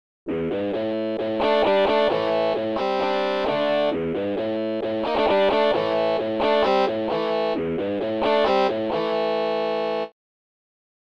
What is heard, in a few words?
Rockin' Rhythm; guitar player licks and lessons